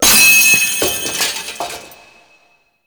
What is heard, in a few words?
mask knock off; Deacon; Warframe; The New War